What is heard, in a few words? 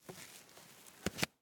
Face Mask, Medical; Blastwave